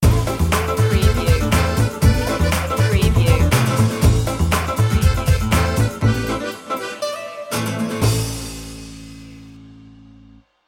sting

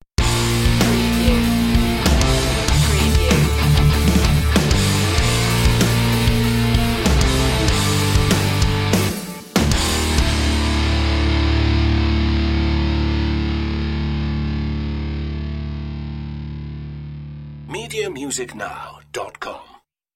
exotic aggression stinger

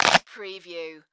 Bite Chomp; sound-effects-bible

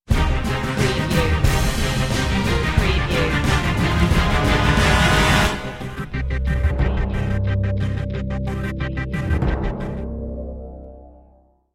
sting no choir

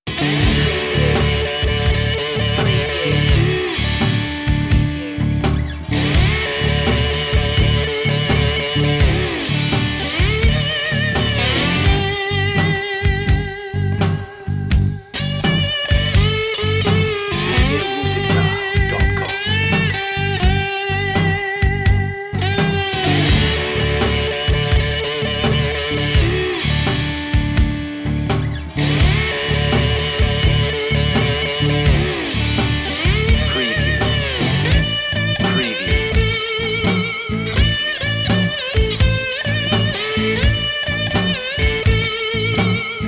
bottleneck blues

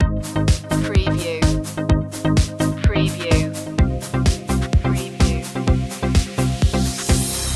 funky digits loop

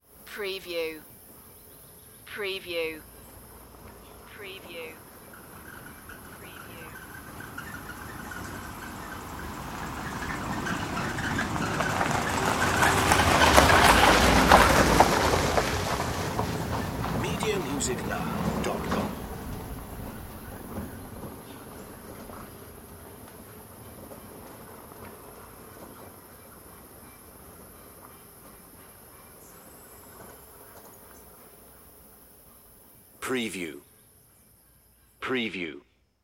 gravel roll car pass

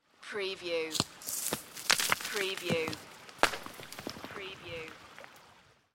Leaves and rocks fall into river

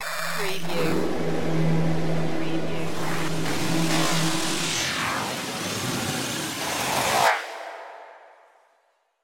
futuristic voltage glitch

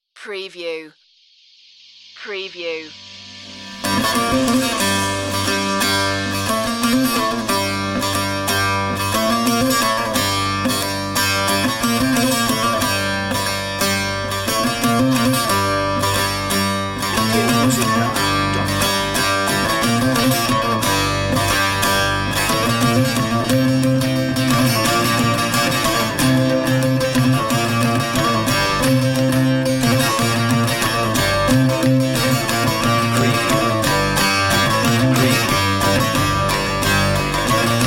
eastern ceremony

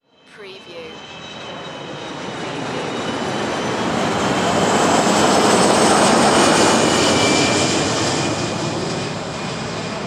Jet Kc135 Pass By; Sound Effects Bible

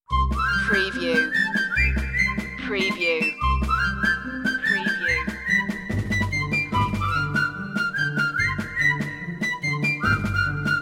playful march marching drums version

